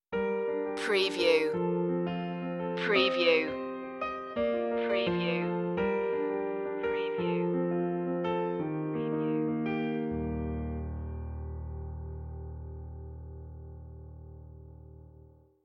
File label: short piano version